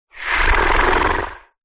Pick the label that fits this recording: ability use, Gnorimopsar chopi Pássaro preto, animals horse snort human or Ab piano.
animals horse snort human